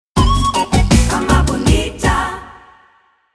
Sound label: sound logo